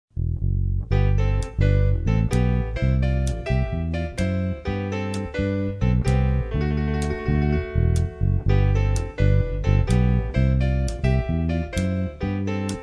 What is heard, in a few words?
vocals